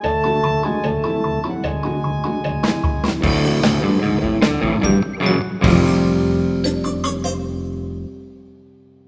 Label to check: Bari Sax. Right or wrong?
Right